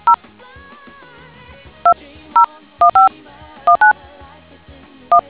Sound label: dtmf